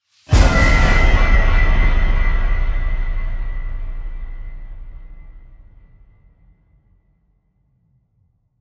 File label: Impacts Demolition; Gothic Storm Music; Advanced Sound Design 2012